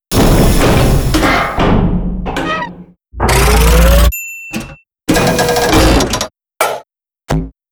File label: tank deploy; Garry's Mod; tf2; mvm